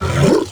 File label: Flying Mouth Attack Fire Empty; Enemies; Warframe; ManInTheWall